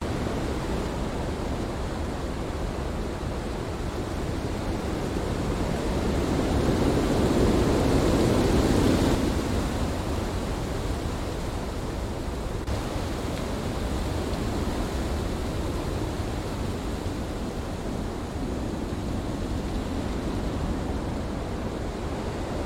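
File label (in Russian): звук глухих лесов свистящий звук листьев и одинокий звук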